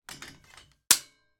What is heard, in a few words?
household stair gate close lock; sound effects one